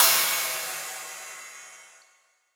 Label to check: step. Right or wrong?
Wrong